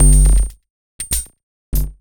Processed Beat, Drums & Percussion

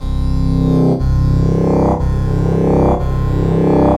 Vectron Synth, Synth Textures; Sonic Foundry (Sony Creative Software); The Electronic Music Manuscript Collection

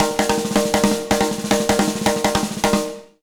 BEAT, Jazz Latin Drumloops

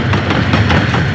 TRAIN TRX, MACHINES; M8; CMI Factory

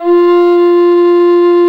ALT F 2, MOECK; SoundBytes - Early Patches

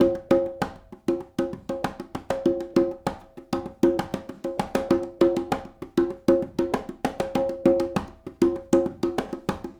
Smooth Groove Congas, 70s Analog Funk; Sonic Foundry (Sony Creative Software); What it Is!

